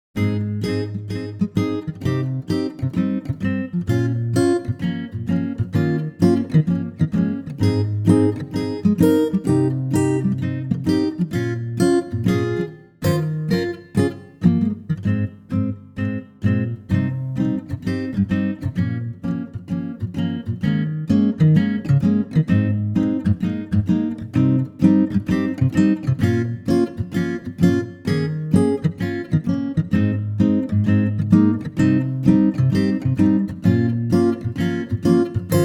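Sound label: acoustic guitar Ab A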